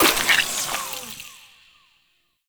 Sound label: Poison Shroom Spore Cast